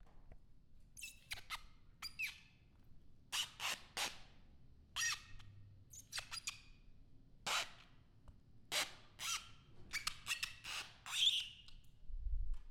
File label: Squeeky Shoes